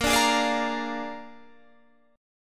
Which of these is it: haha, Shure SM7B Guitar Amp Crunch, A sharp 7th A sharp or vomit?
A sharp 7th A sharp